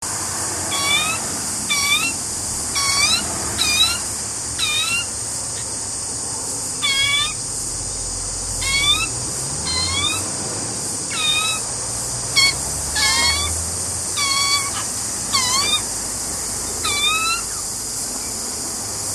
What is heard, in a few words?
Butcher Bird, Give me food